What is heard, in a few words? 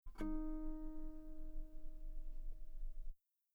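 guitar D sharp 4 very long piano harmonics; bananenkeyboard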